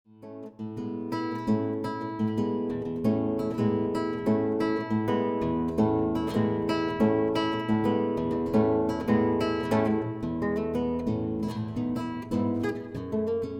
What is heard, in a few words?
Guitar Piece; Bagpiper Guitarist